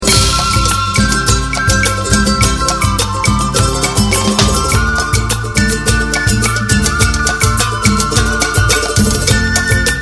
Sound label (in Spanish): candombe